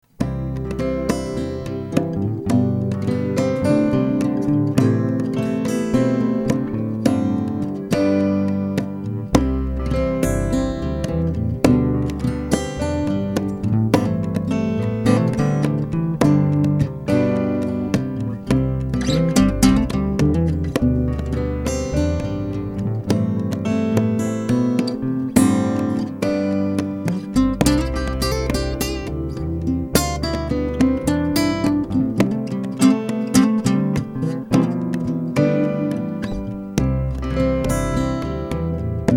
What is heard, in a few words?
Acoustic Repair Job; admiralbob77